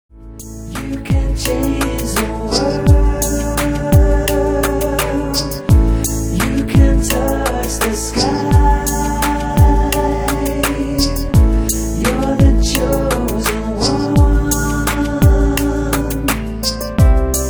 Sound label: Cry